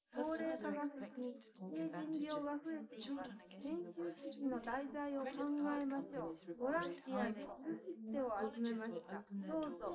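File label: female, live recording 250ms 5cm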